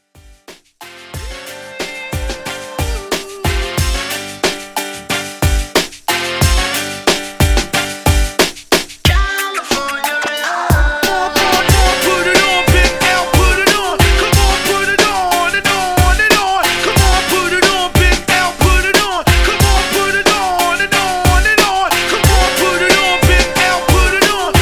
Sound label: 90s Hip Hop Redrum 91Bpm